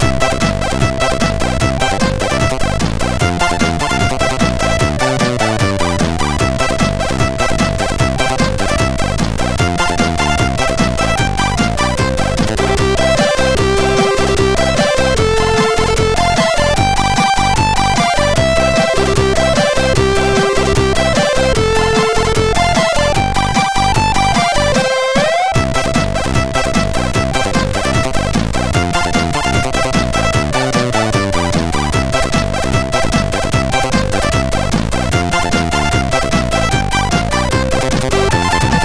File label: Jingle Bells, sid MOS6581R2